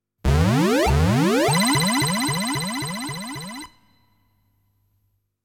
Return Magic SFX

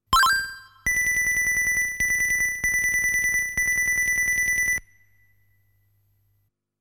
Conversation SFX

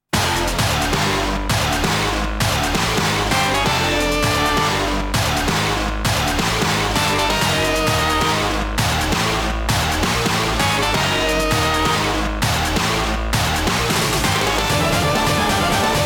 Boss BGM

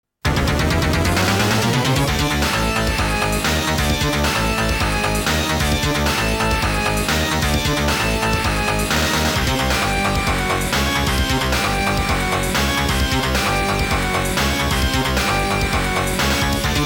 Boss BGM

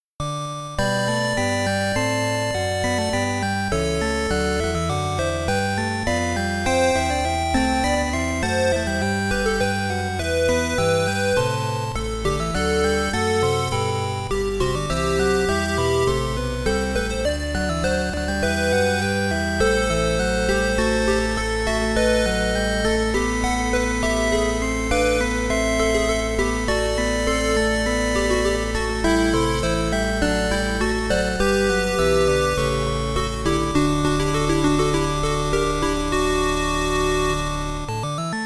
Morning Music

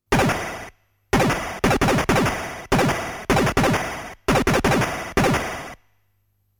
Bullet Striking Enemy

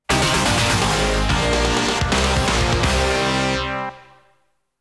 Game Over

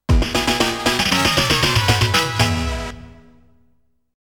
Game Over

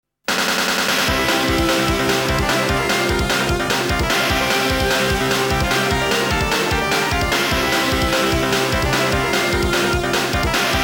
3D Boss BGM

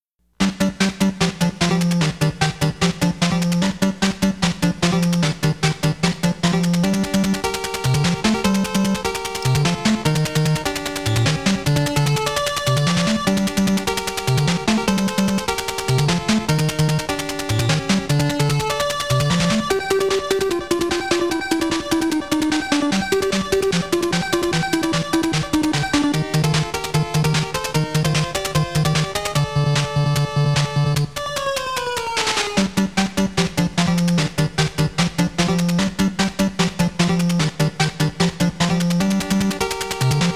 BGM

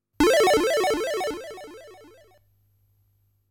Level Up

